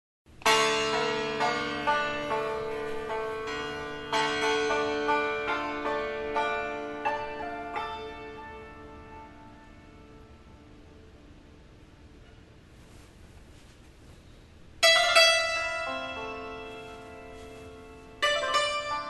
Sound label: santoor full song